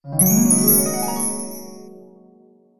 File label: magic turning spells casting